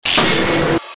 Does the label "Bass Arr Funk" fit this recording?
No